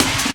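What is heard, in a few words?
snare drum, Drum & Bass, 160 BPM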